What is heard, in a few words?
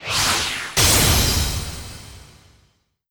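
Battle Vs Mode In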